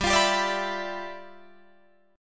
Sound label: G 13th G Guitar Drop D, chord